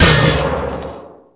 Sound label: hit bucket; hits; Tony Hawk's Project 8; XBOX